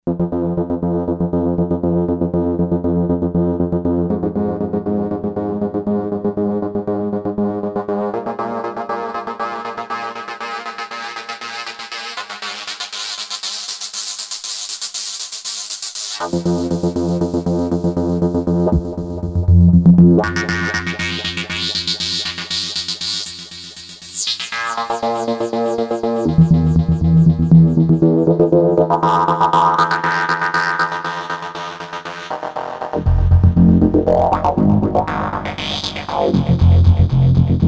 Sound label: Acid Arp, Novation XioSynth 25